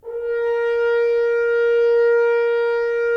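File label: Solo French Horn, brass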